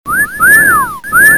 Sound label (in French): Sifflement